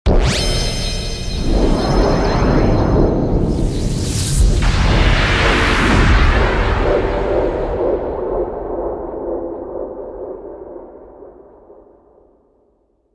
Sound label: Launch and Go, DJ sounds